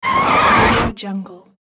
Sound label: abrupt car brake